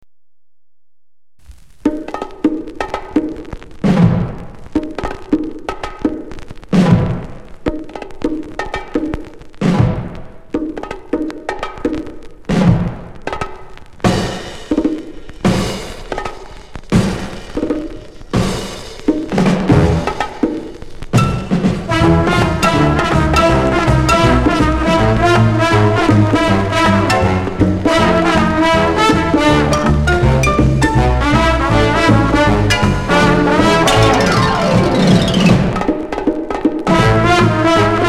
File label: bongos